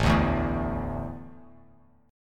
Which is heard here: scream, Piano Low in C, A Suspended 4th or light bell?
A Suspended 4th